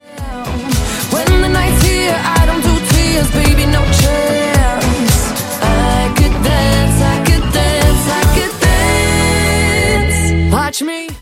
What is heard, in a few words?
cadences